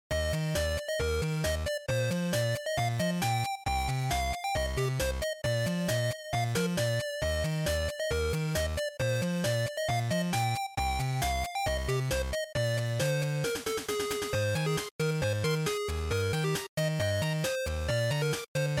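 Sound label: Game Boy Action Stage, bgm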